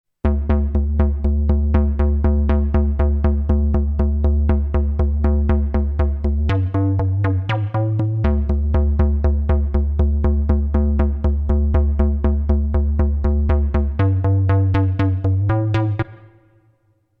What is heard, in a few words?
Alto Perc